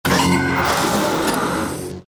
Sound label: electric door